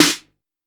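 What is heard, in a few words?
TR808 Snare, Roland SH-32 Drumset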